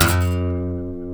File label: G, funk guitars